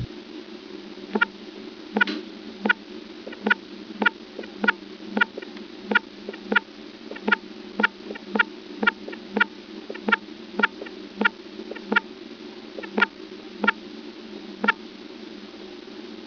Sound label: Acutalis, treehoppers; Cocroft